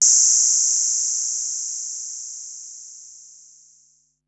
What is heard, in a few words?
Noise High, Korg Wavestation-SR Drum Kit